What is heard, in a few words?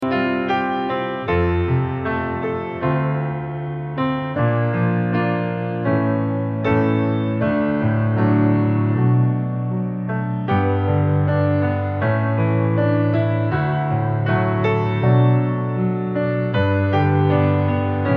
piano solo